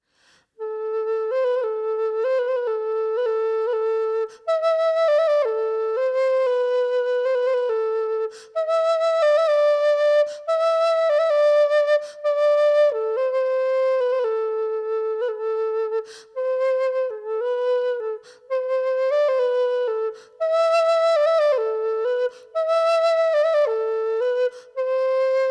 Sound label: whistle G